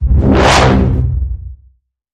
Bend The Bassline C

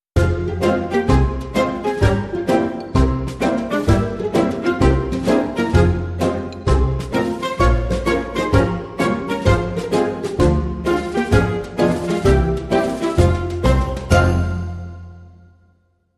Polka

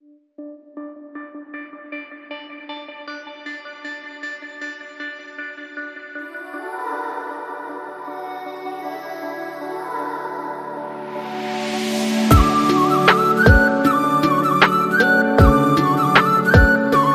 Kerala Cafe, No Drums

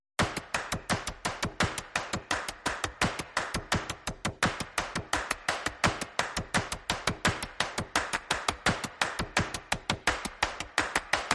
Bongo, No Conga

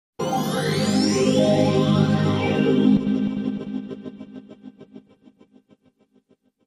Clubland Burst

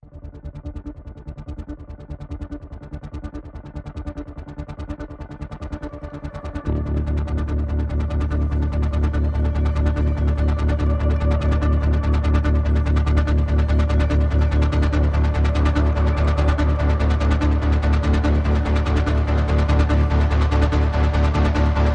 Pads and Pulses Only

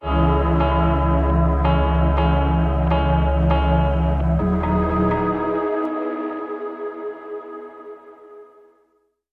Drums, Link Sting

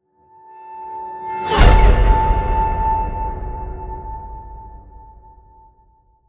Broken Piano Whoosh

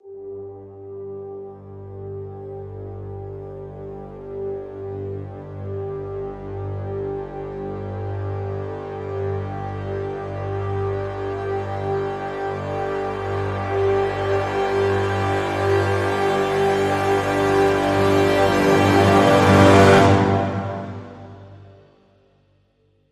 Brass Swell Full (G)

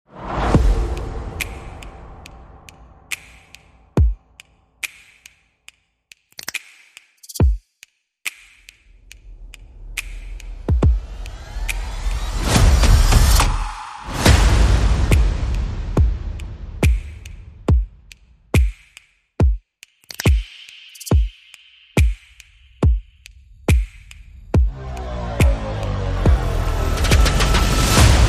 Percussion and Effects

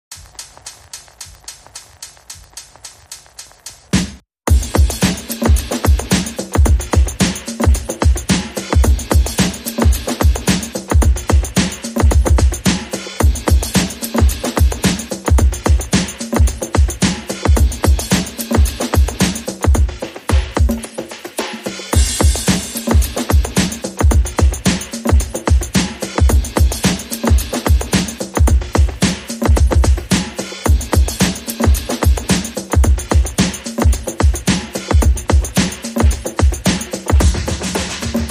Drums Only

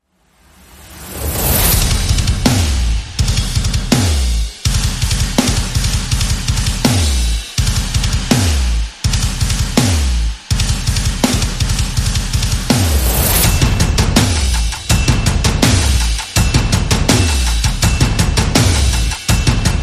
perc only

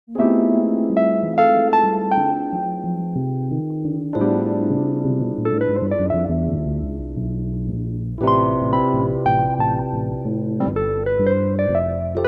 Bass Night

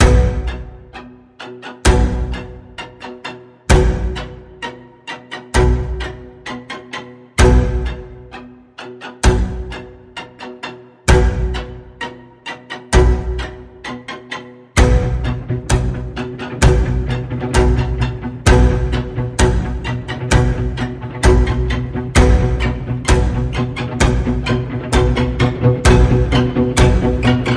Sinister String Pulse B, Key of B

